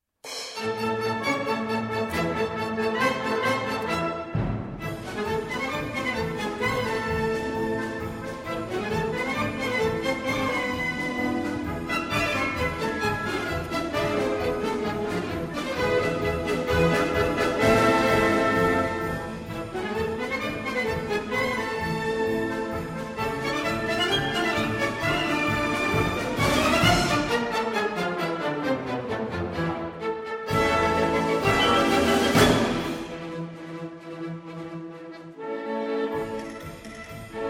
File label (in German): Spanischer Marsch, Violin/Cello/Piano